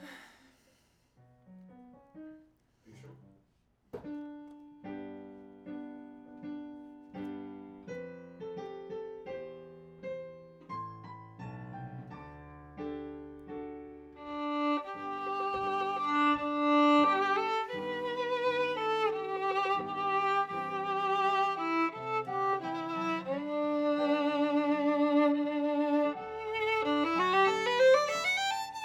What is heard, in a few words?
Viola C